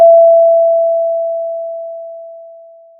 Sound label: pop, Sound of a doorbell